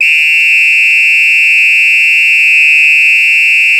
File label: REPITO, World Whistles